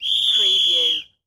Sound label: Whistle Blow Referee; Sound Effects Bible